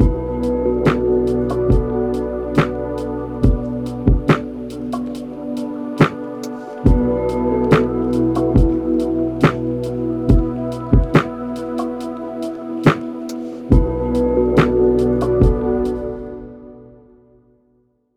Lotus Blossom Melodies; yuttari

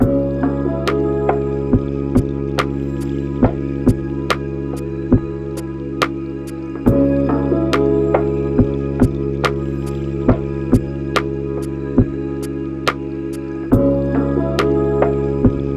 Golden Temple Harmony; yuttari

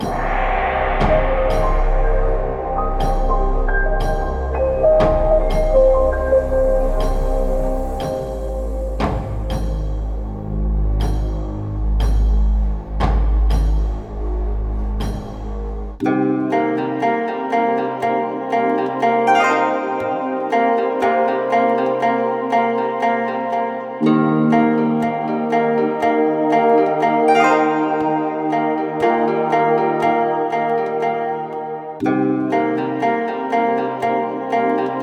slow; yuttari sound

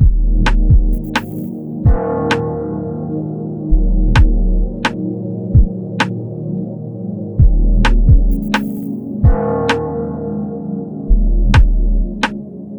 Enchanted Forest Melodies; dark sound

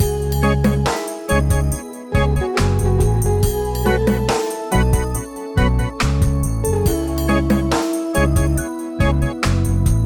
reggae; yuttari sound

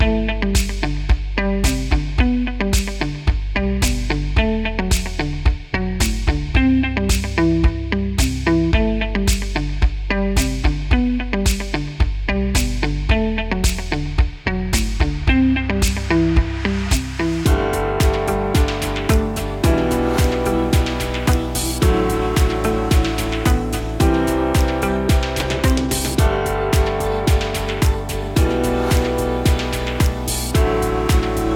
American Rock Roll

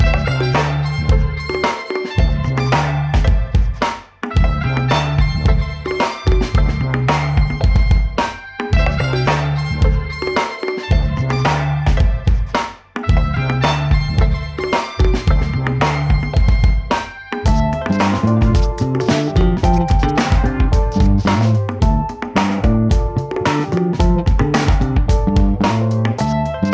guitar backing; rock sound

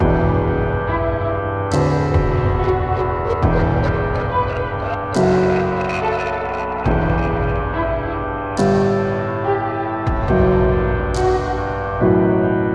hiphop; dark sound

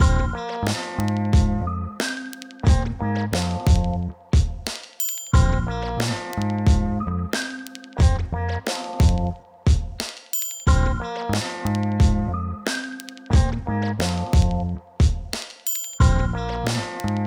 hip-hop; vlog sound

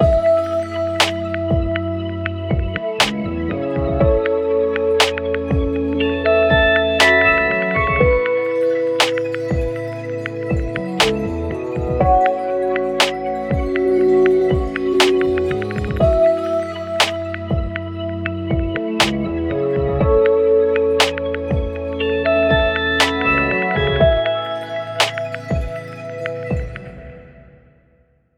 Karma Chant Chronicles; yuttari

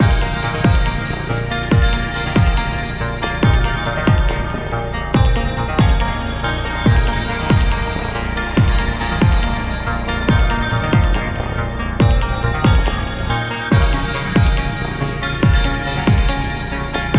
Cybernetic Cityscape, dark sound

